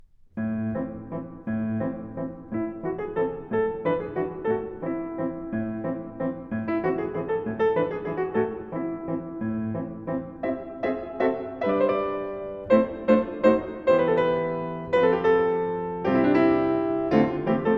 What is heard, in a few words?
Waltz